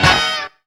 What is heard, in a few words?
SASSY HIT; Zero-G; Funky Elements